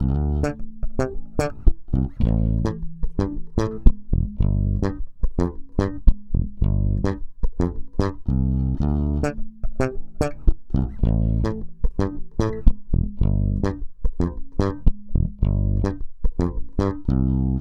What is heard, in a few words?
Bass loop 109 Dm, Electric Bass Loops Direct; Triggerfish; Slate Digital BASSHOLE Sample Pack